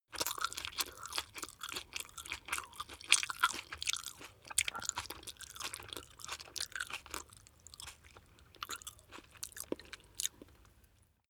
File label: chew spaghetti smack medium